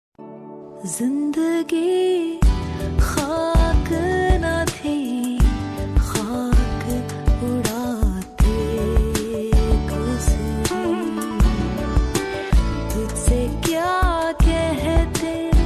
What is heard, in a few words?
OST ringtone; Zindagi Gulzaar Hai Ost